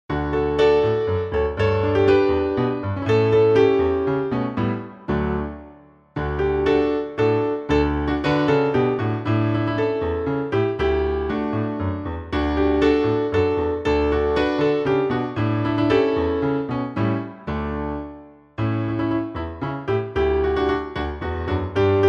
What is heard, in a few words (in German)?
hymn D-dur; piesni patriotycznej